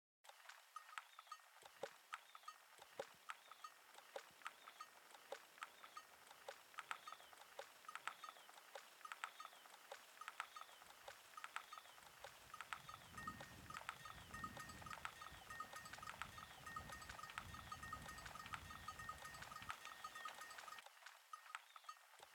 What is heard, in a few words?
wind ambience birds